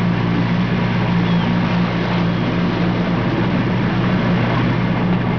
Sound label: ENGINE